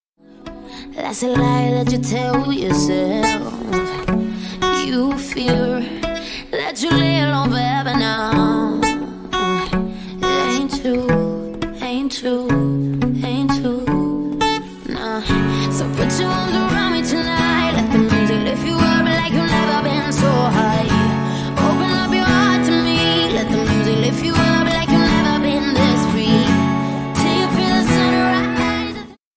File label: (Acoustic)